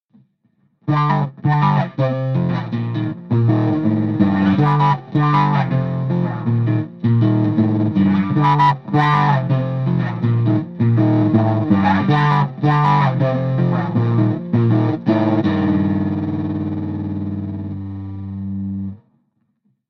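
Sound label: Gm 160 guitar riff trash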